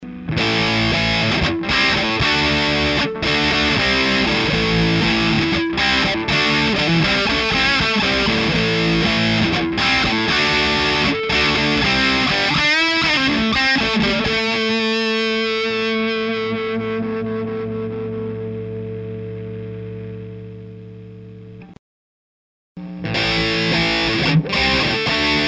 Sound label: BRIDGE P90 LP Si 1Meg input vs GeTran